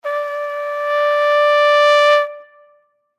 Flugelhorn, Flugelhorn Crescendo G; Brass; Blastwave

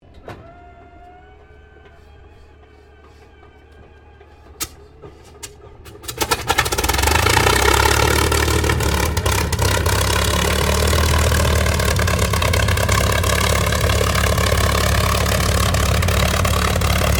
AIRCRAFT CAC CA-18 MUSTANG MK23 STARTUP IDLE TAXI; CA-18 /P-51 Mustang; Airplanes; Vehicles; Aircraft Collection; Twistedtracks

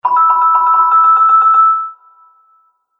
Collect Prize Motif, video game sound effects

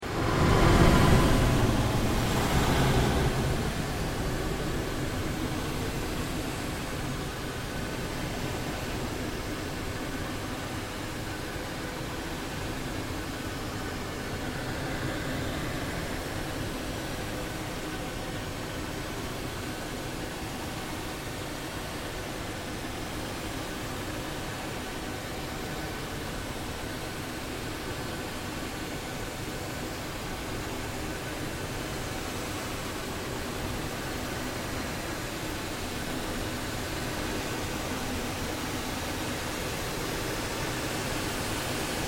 Ambient Drone Slow Pulse; Sound effects created by manipulating other sounds; Drones (Sound Effects:Sound Design)

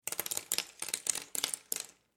Food Nuts Pistachio Set Down On Kitchen Counter; SFX Bible

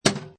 Pinball Sound Clunk; Video Game Sound Effects; Lynne Music

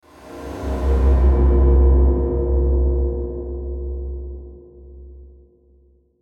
Whoosh Descend Metallic Shimmer To Ring; Blastwave; Whoosh Sound Effects

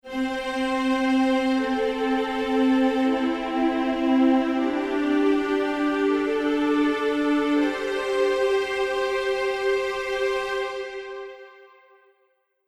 Classical Ident 80Bpm Strings; Musical Sound Effects

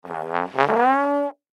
Baritone Note, Baritone Note Slide Short; Cartoon; Musical; Cartoons; Blastwave

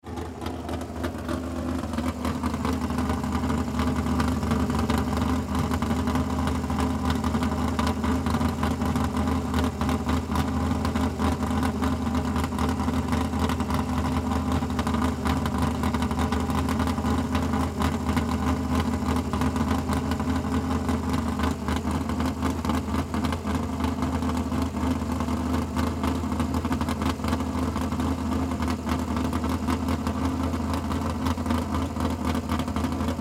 Swamp Buggy Idle; Rev Shut Off; Transportation Watercraft; Boat; Boat Sound Effects; Blastwave

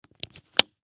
Camera Case Buckle; Digital Olympus Camedia C720; Technology; Cameras; Electronics; Blastwave

